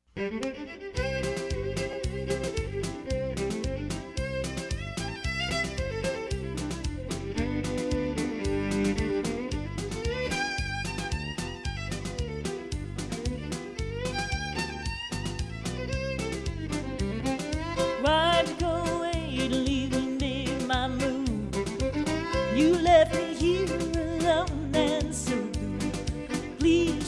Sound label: solo